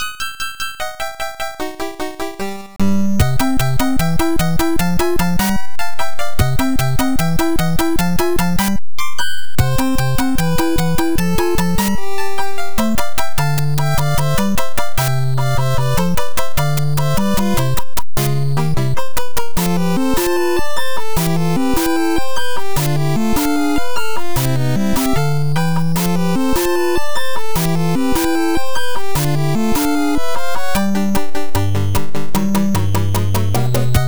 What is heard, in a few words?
nes athletic background; super mario bros 3